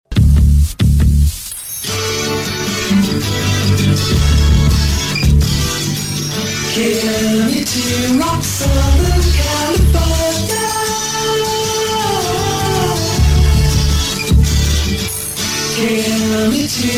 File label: Jingle; KMET